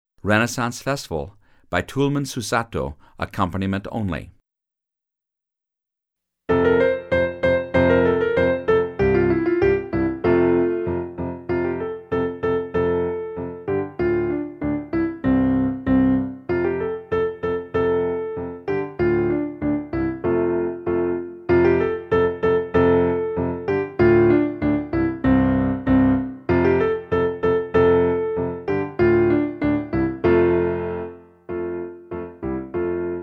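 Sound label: Renaissance accompaniment, band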